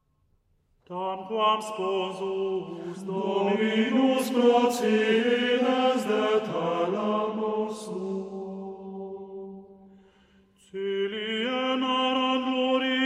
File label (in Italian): Canto Gregoriano Antifona Tamquam Sponsus Psalmus Caeli Enarrant; Catalogo musicale musica antica